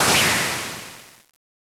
Boss Destroy

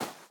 Footstep Sand

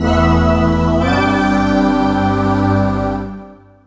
Jingle Level Event; Donkey Kong Country Returns HD

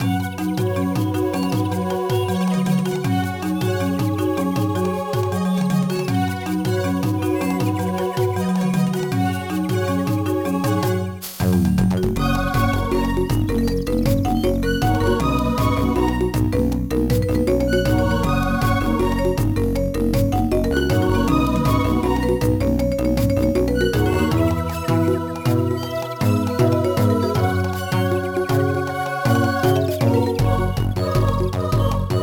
Loop Swamp Jungle; Digimon World Dawn and Dusk; Swamp-Register Jungle

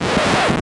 Sfx Basketball; Basketball Fever

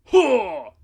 Grunt

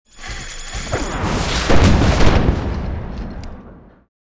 Precision Strike; starbites

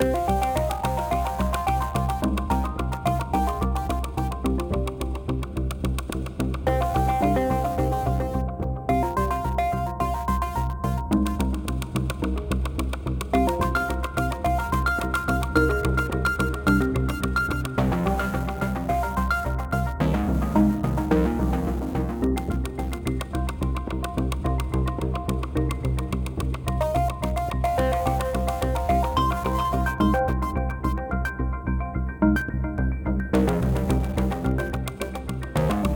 Hacking Loops 108 Bpm

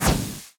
Ceiling Dropper Charge; gamerip

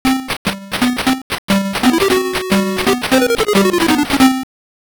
Fanfare; Sports Illustrated Championship Football and Baseball; Game Gear

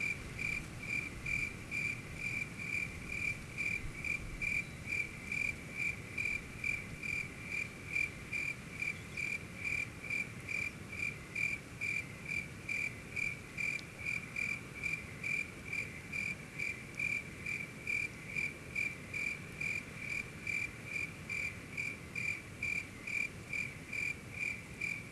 Ambient, Quiet, Night; rayman raving rabbids playstation 2; Prototype Ambient

